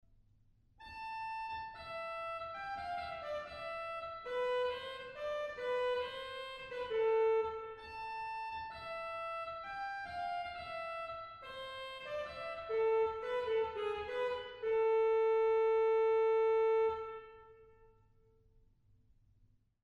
Cornet 2; stade

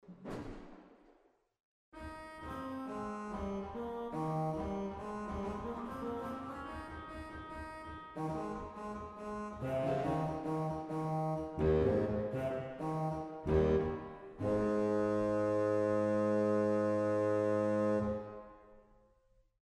Trompetten Bass 8; waltershausen

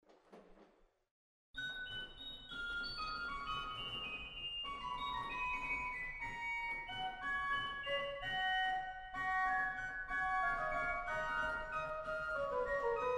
Wald Floete 2, organ; waltershausen

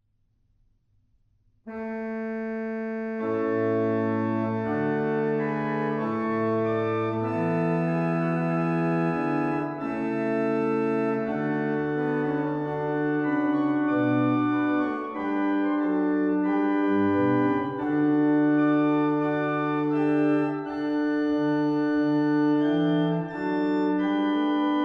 magnificat VIII; H.Scheidemann

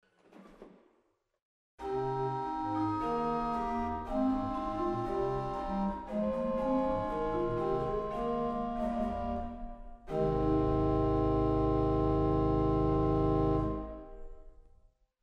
Gross Qvintadena 16, organ; waltershausen

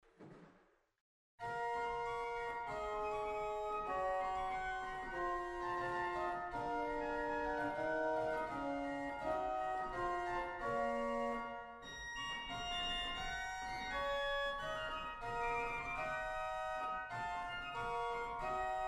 Spitz Qvinta; waltershausen

